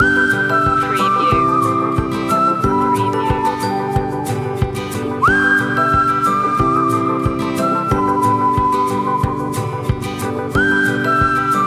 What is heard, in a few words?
whistle along